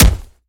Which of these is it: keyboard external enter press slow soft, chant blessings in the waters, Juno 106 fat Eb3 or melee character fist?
melee character fist